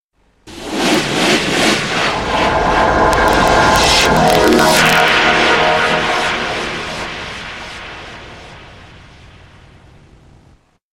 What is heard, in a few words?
scary; cte logo